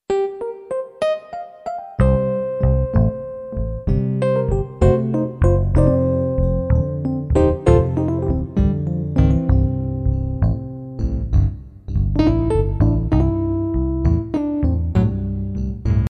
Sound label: Jazz Blues